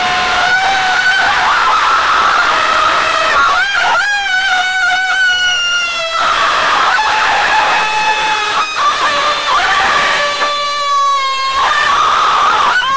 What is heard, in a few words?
crazy fast screams in ago; theme